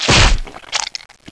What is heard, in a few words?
splat; kimboot